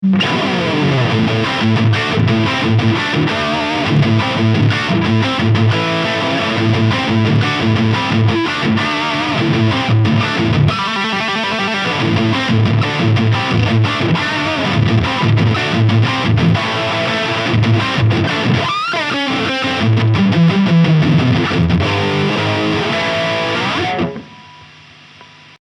Orange Rockerverb 50H MKIII High Gain; Lea Paul

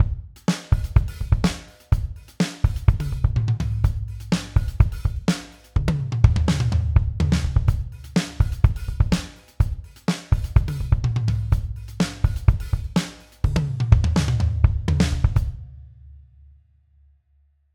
Drums Old n Dusty; Steven Slate Drums 5

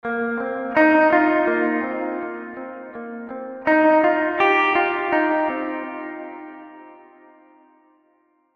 Floating Guitar

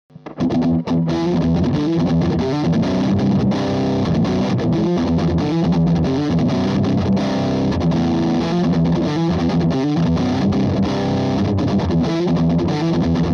Metal

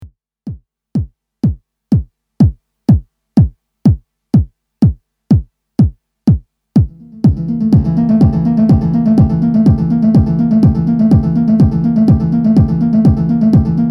Lexicon PCM70 Resonant Chords

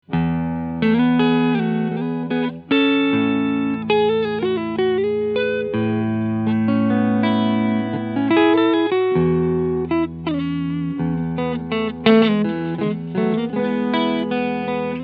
Squier Tele Clean Sound Neck PU; 40th Anniv

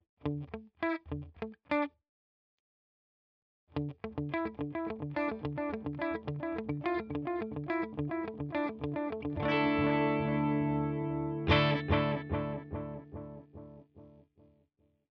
Walrus Fundamental DELAY Analog more

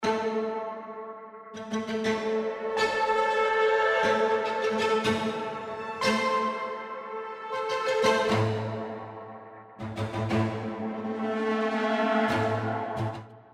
Hybrid Pedals Triplet

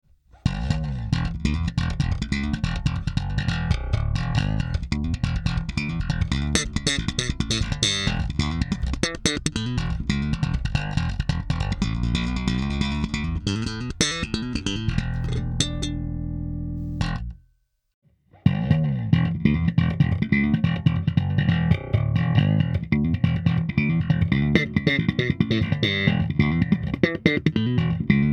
aer amp one active j v slap

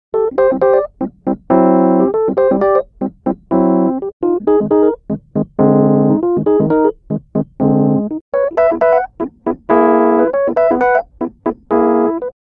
Rhodes

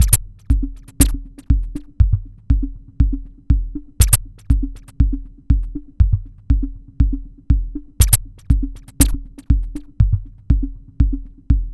Dreadbox Drips V2 Delay bd

